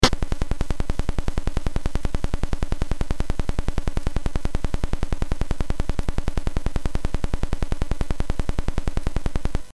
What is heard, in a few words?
sid CSG8580R5; Silly 64 BASIC